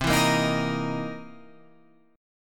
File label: C 7th Sharp 9th, C, down, Guitar, Open F; strummed; chord; JGuitar